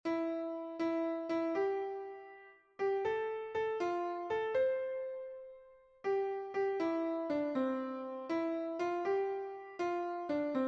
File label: O Holy Night Piano